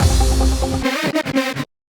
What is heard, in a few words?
FILL, PsyTrance